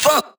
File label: vocal shot; MINDMINE